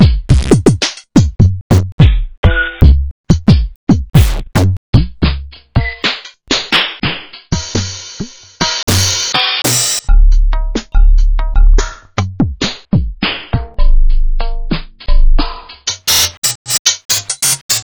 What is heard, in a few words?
Korg Wavedrum